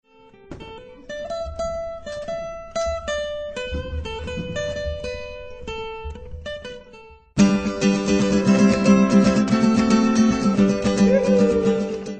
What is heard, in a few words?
tenor